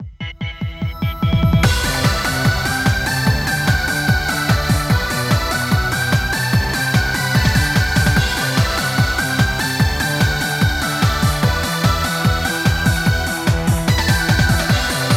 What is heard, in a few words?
Ready BPM 147